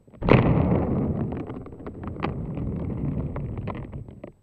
Plastic Tub, contact mic, Marbles; musicradar; contact mic madness; Raw

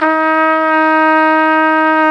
Solo Brass, Tpt p, RCA

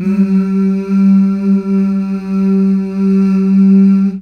Mmh Male, CH BACK; Best Service Gigapack 1

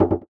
Percussive Hit, contact mic

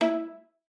E4, VIOLA FINGER; Xsample VOL-5

